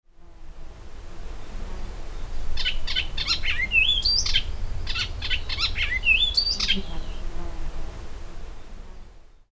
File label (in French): hypolais icterine